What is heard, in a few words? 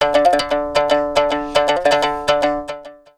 Egyptian Dance